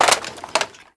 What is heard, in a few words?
gewehr reload clip out